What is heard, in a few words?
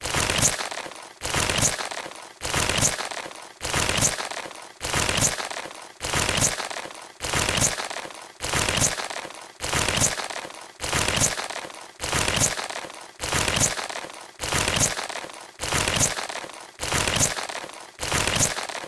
trap